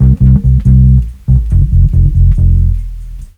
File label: bass loop, Ragga & Dub Reggae; USB Soundscan vol.27